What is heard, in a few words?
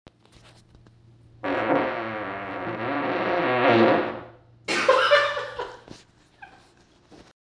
epic fart long